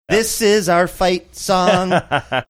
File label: singing this is our